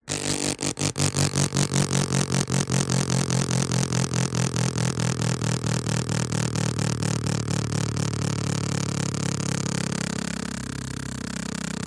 vehicles bicycle pushbike wheel spokes spin paper against clicks slow